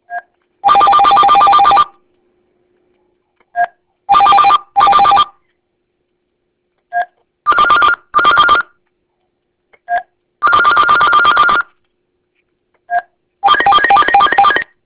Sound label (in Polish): n720 dzwonki; centertel; nmt